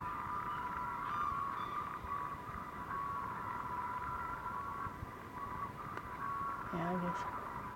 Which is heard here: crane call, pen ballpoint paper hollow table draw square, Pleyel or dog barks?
crane call